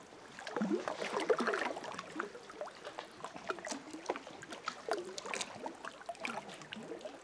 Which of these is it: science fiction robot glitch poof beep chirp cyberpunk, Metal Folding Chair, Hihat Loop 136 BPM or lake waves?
lake waves